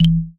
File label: Button Spin; slots; ui